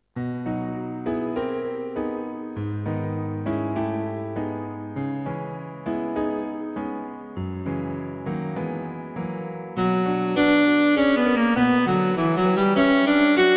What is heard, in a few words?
Cello; Cello and Piano; The Piano part is also very soloistic.